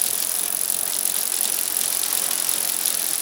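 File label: monocycle fast loop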